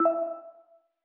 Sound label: MAJOR ALARM